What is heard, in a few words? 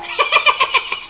cackle